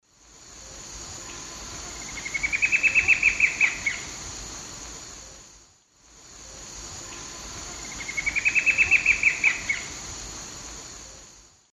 Herpsilochmus gentryi, song, S. America Eastern Lowlands